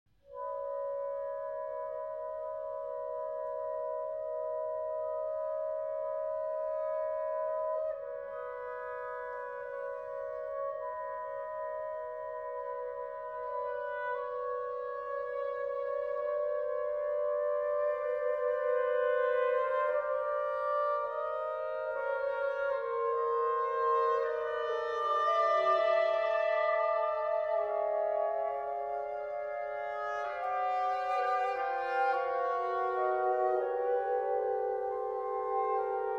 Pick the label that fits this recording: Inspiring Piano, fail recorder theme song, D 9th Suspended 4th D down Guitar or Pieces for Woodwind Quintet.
Pieces for Woodwind Quintet